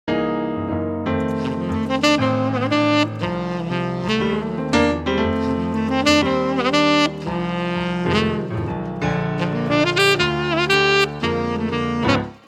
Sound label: sax duo